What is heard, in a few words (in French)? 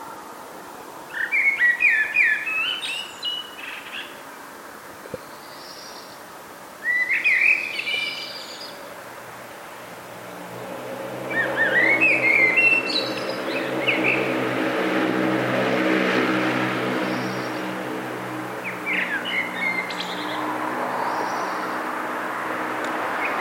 merle noir à l'aube